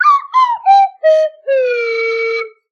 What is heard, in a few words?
slide whistle good laugh